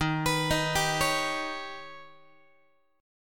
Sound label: D sharp Augmented 7th Guitar; arpeggiated; x,11,14,13,11,12; JGuitar